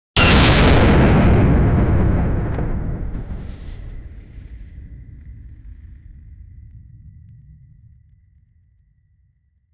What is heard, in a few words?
a glass vial of explosive; burst